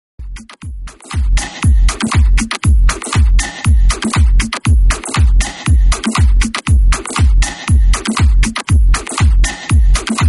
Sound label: sad house mix